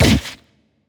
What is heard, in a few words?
spike Hit; Thalamus; CARRIER